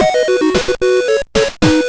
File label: Level Complete; "Level Complete" jingle (also the "Game Over" jingle for Balloon Trip mode); zelda